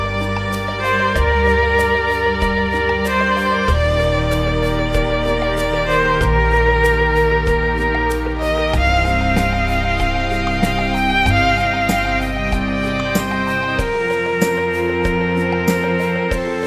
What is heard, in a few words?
Tone